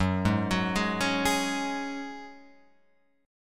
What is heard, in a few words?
F sharp Suspended 2nd, Guitar Open F; arpeggiated; JGuitar